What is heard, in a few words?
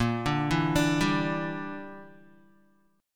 A sharp 6th Flat 5th, Guitar; A#6b5 arpeggiated; JGuitar